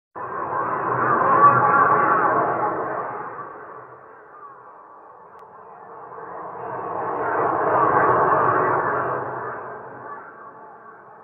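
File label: sound of psi radiation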